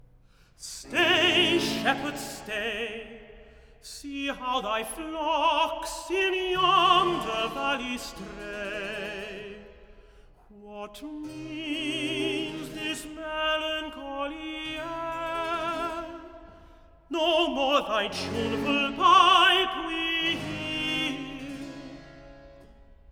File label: Recitative; Stay shepherd stay; Handel; Acis & galatea